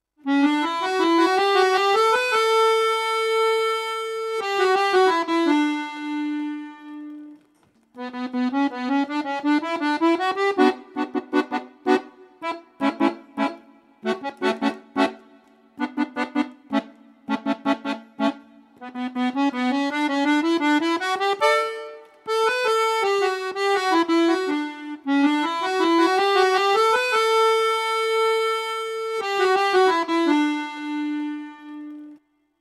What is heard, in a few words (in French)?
accordeon